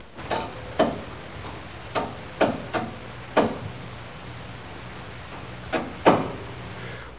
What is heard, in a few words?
click